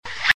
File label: card turn